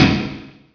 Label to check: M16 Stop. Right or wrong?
Right